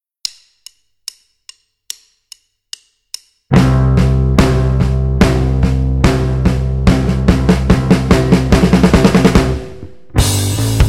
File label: playback, Rhythm Guitar; Basic Rhythm Guitar II - "rock it!"